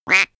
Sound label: quack duck, comedy cartoon vocals; soundpool